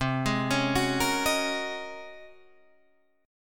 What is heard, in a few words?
G sharp 11th C